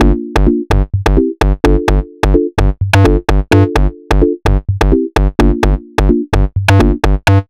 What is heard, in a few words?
128BPM Melody; Vengeance Samples; Tall Anan Kit